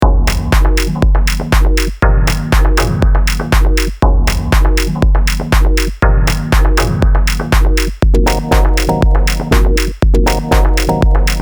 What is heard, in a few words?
Signature Chords